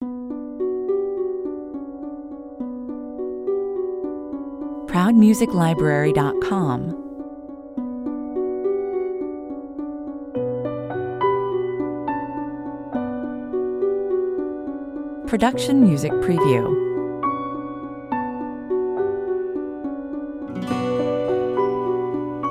Modest, atmospheric composition with harp arpeggios and other subtle sounds, 104 bpm